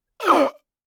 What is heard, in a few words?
death gasp pained gasps short inhales